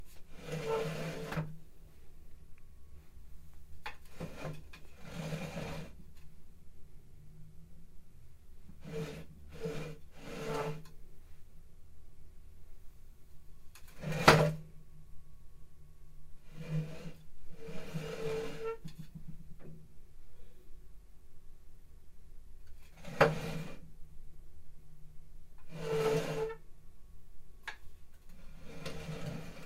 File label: Chair